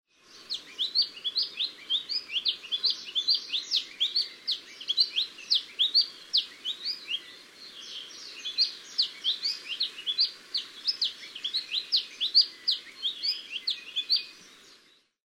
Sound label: maui alauahio